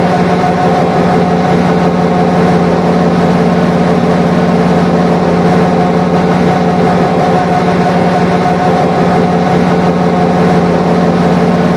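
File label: exhaust idle; AOS F1 2022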